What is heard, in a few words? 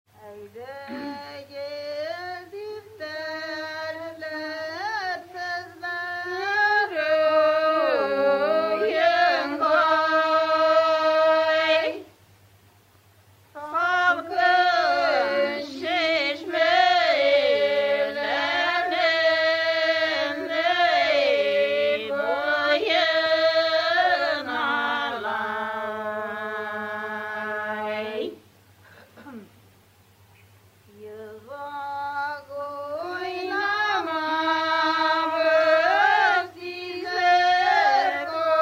tatarstan, polyphony